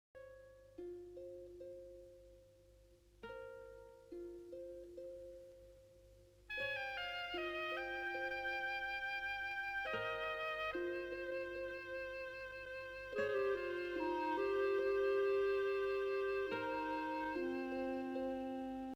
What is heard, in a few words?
mississippi tune